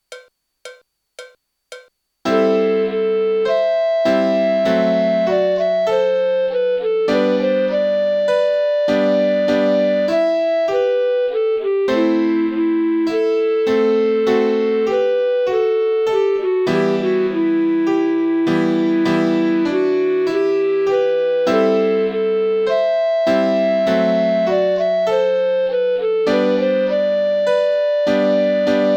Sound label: Black Melody Piano